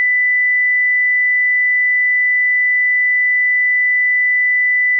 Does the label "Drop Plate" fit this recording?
No